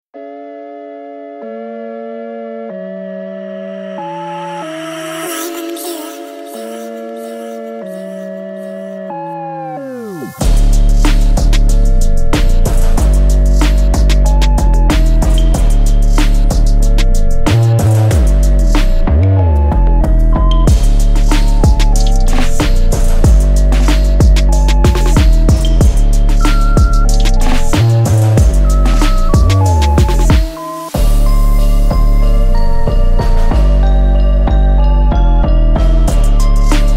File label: Hip-Hop